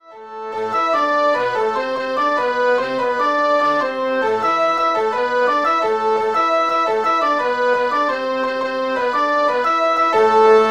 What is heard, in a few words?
Wind Ensemble